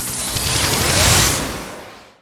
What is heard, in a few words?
recall lead; LoL; blitzcrank skin07